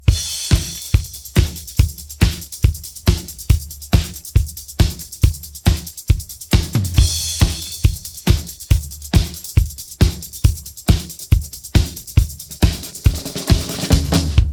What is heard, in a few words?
pattern, drums